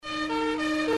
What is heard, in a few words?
Commercial Siren; Robots In Disguise television show